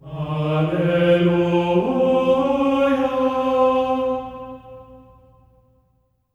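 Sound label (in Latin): alleluia F4; Spectrasonics; Symphony of Voices; GR ALLELU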